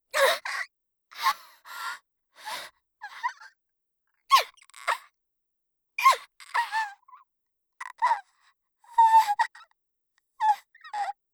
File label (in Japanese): みぞおち; 効果音なし